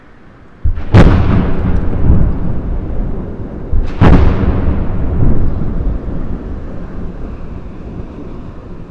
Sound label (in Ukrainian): Звуки ППО